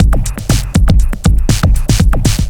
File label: Kit 10 Odd Sig 120; off the grid